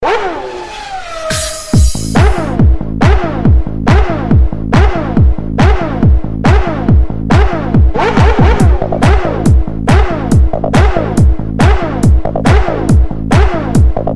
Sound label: Bass Remix; toque de celular